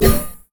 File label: prismatic wave self; LoL; lux